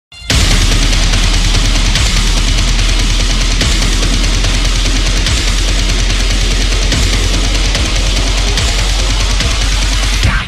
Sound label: Deathstep